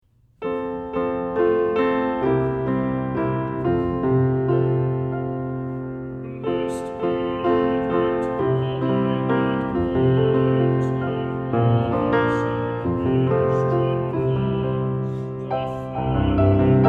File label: Piano; Blest Be The Tie That Binds; piano accompaniment pieces